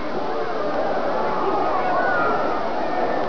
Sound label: CROWD sound